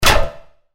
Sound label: laser brr